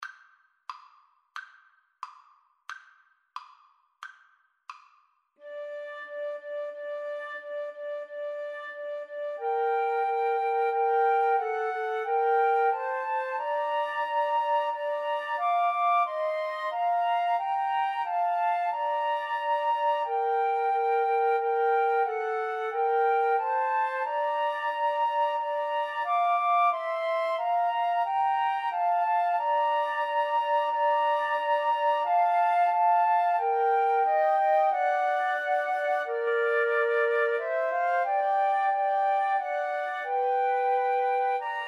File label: Flute Trio